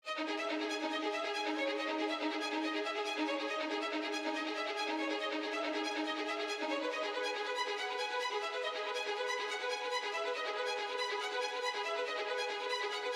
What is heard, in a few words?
violins